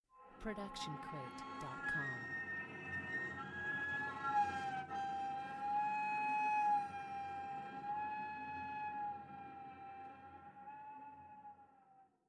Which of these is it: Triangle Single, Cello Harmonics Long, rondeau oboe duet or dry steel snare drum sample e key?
Cello Harmonics Long